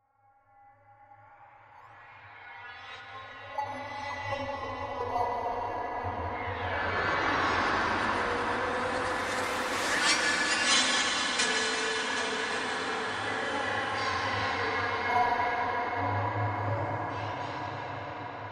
Sci Fi Bed Swells Eerie; Blastwave

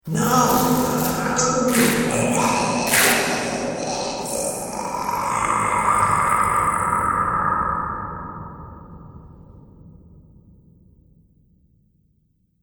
Lycanthrope The Change, monster sound effect; Andrew Fleming

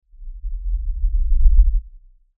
LFE Reverse, Lfe Reverse Element Swell Low Bass; Blastwave; Sound Design

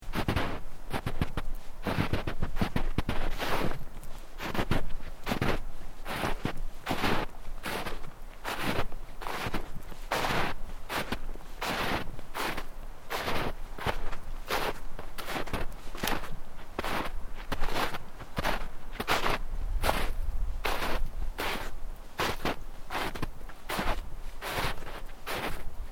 Footsteps Boots Snow Deep Sequence; Footstep Foley; Human

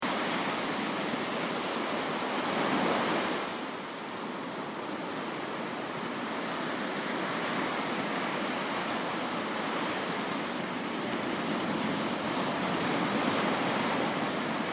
Ocean Beach Sound Of The Sea Stormy Sea; Surging Billows; Crafted Signals

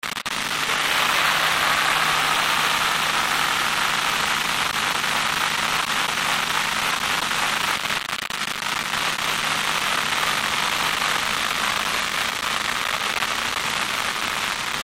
Bed Static Distortion Glitches; Blastwave

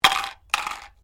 Dice Medium Drop Plastic Cup; Variety of dice sounds; Casinos

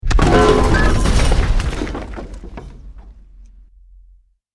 BUILDING COLLAPSE SCAFFOLDING SMALL; Created dramatic effects of buildings collapsing or breaking

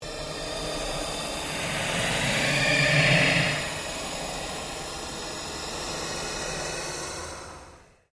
Ambient Pulse Ghost Chatter

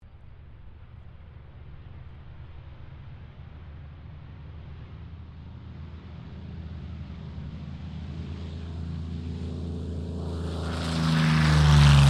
AIRCRAFT P-40F KITTYHAWK TAKEOFF; P-40F Kittyhawk / Warhawk/Tomahawk; 1942; Airplane Sound Effects; Airplanes (Sound Effects:Vehicles)

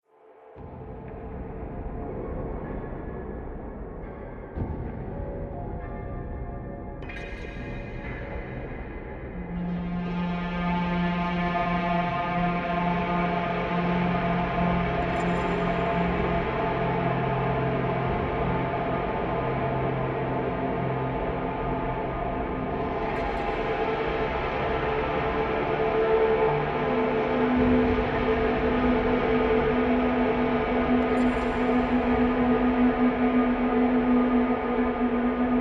Drone Terror Unchained; Drone Sound Effects; sound design

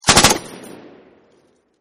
M240 Machine Gun Burst 3; VRSonic; Guns

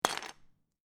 Lego, Single Lego Piece Drop Onto Pile Of Pieces; Miscellaneous Toy; Lynne Music

